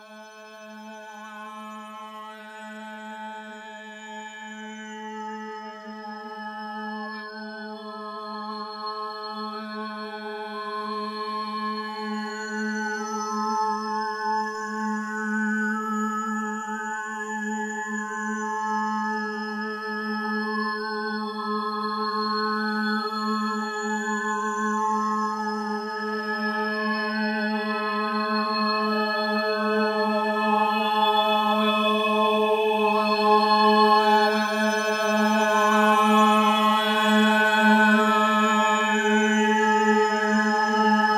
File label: 852 Hz